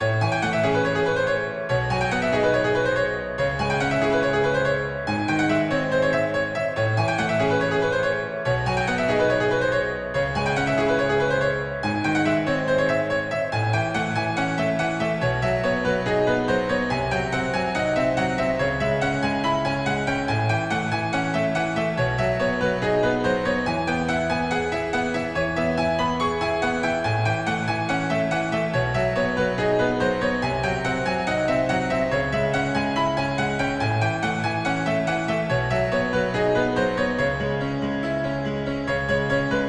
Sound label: Piano; 東方神海祭～深海Piano